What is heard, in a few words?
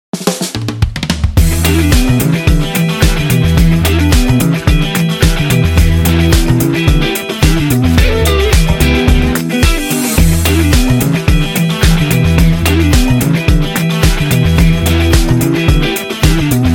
Funk Dance; casino